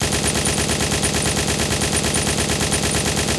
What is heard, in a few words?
machine gun fire